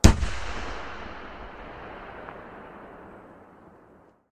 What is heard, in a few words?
boom, Firework